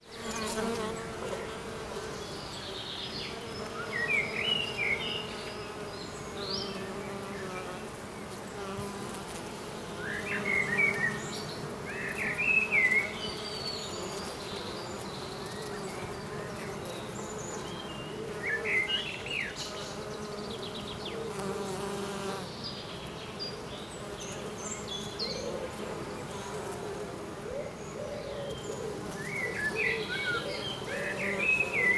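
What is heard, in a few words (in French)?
Abeille, Paysage sonore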